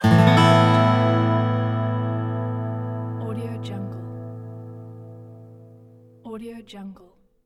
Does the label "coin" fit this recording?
No